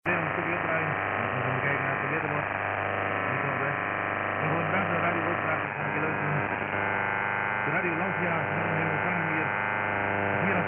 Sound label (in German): x Band Hollaendischer Pirat 1636khz